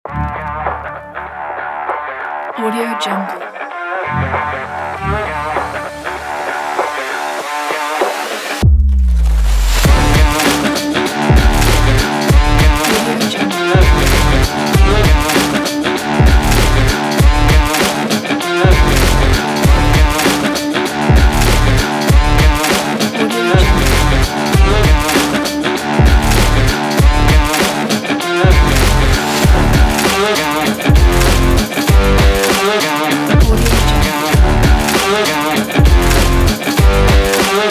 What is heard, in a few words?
upbeat sport rock beat